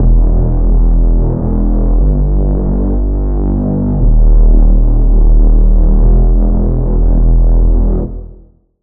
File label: Reese; Vital Expansion; Street Vanquisher Vol.1; Routed Sound